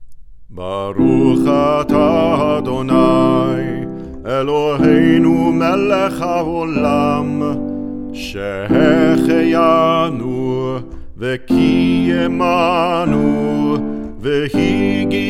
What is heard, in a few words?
Chanukah Brakhah Shehecheyanu